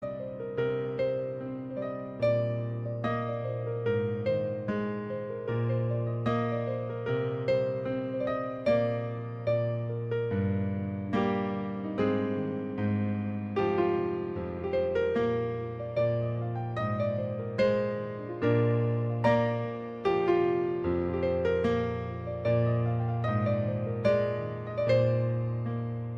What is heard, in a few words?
Piano Cover